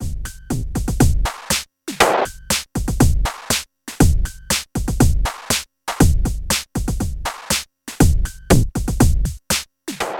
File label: Transient Plus RING Mod by OSC1 CV Start Sample Variation; Future Retro